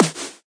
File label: double snare; single hits